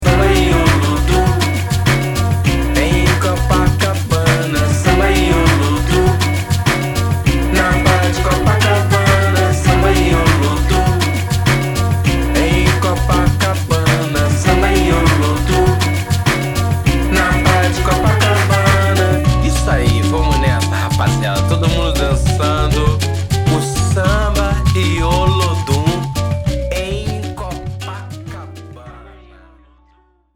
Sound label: lounge mix